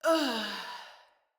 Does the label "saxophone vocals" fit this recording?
No